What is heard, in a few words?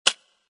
Click